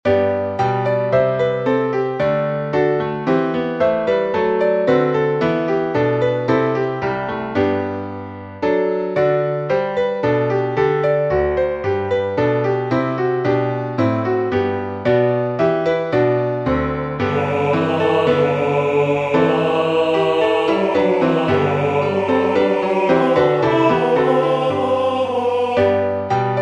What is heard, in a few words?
myn lyking tenor